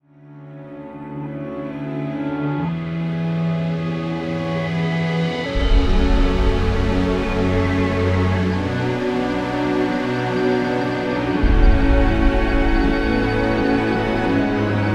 Synth vs Live orchestra; Tom Player

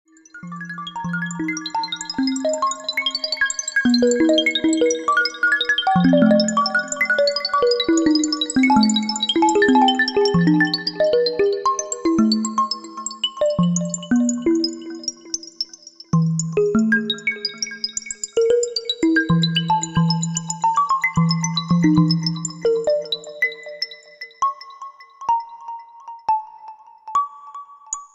Mad Marimba

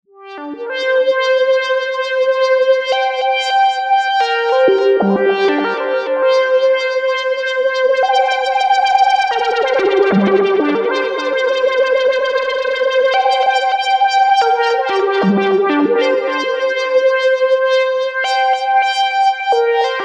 MKS 80 Lead; from Live Set

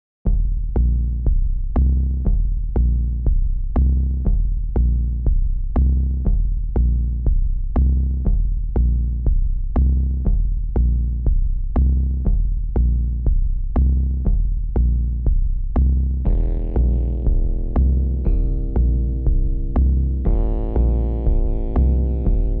recycled rhodes; microtrack

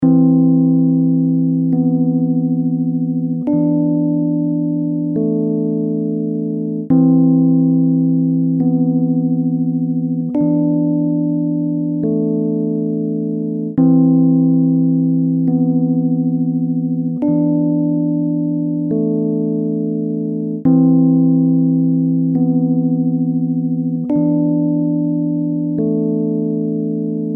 unprocessed rhodes at 138 72bpm

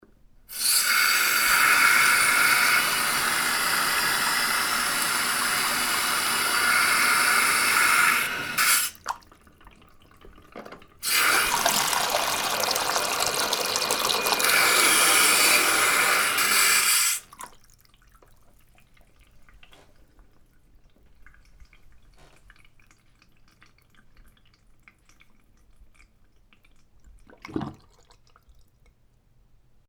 filling and draining the sink

